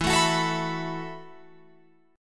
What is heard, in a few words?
E Suspended 2nd Suspended 4th E, Guitar, Open F; Esus2sus4; strummed; 16,16,18,16,19,18